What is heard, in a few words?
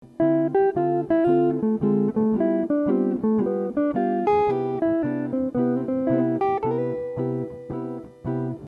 major 2 5 1